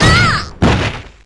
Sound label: dynamic; p4u